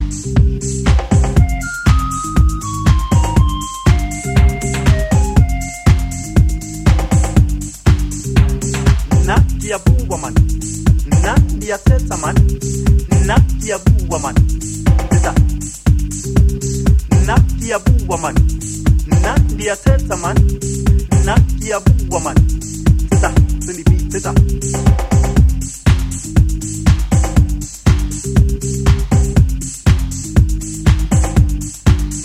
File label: drums